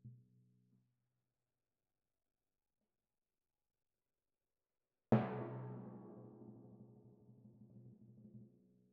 Timp Close

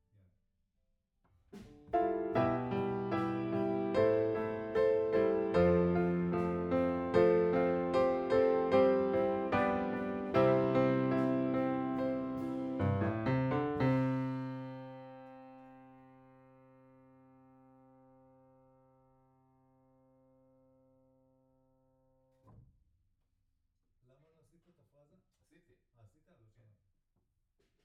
Piano B

